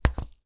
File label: seasoning large pot down on surface